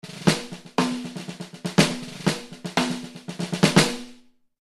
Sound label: gretsch 2 loose over tight, Gretsch 5 x 14" maple Loose top head, tight bottom head